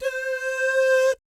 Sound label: DOO, Vocal Planet Jazz; ILIO; Jazz & FX; JAZZCOMBO